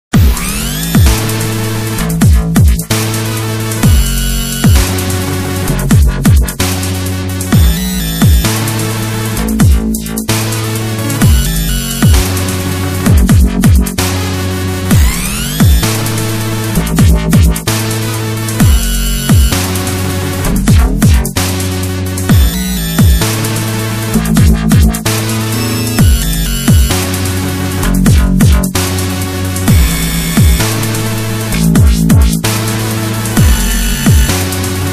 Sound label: dubstep mix